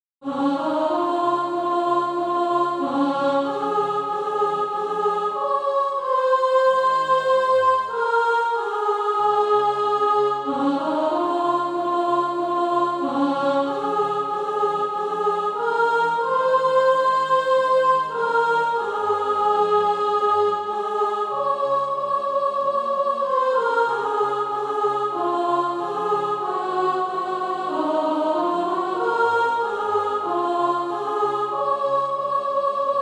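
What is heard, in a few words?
soprano, Practise Music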